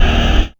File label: GUITAR BOT